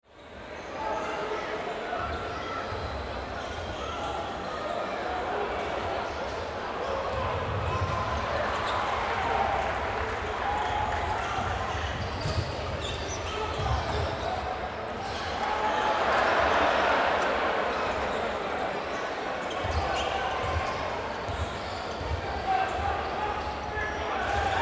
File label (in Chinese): 战中环境音; nba